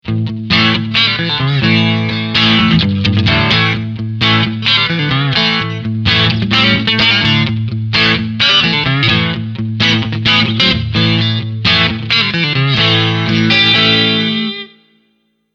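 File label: Front Singlecoil Clean Pick, guitar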